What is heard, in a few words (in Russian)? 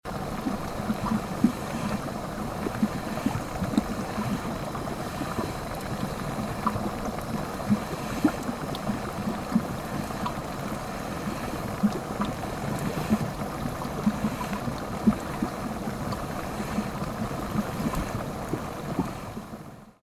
kipjashhaja voda na kuhne